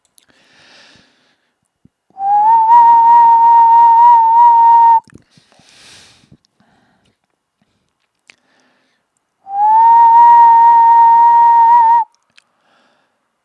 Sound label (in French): sifflement; creer un instrument a partir d un echantillon